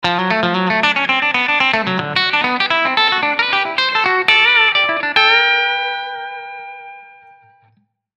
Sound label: Tele Country